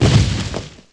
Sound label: mortar